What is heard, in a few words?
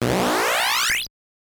Opponent Misses Big Uppercut Blow; Mike Tyson's Punch-Out!!